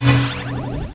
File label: SHRINK; heat